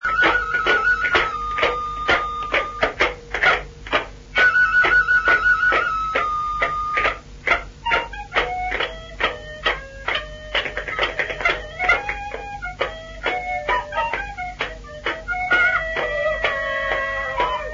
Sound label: pipes